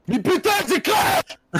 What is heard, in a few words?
crash